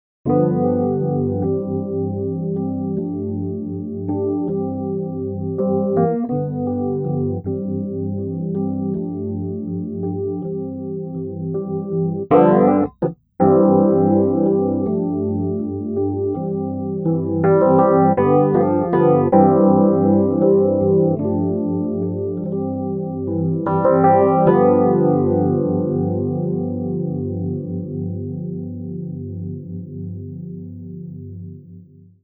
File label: subtle rhodes; u-he uhbik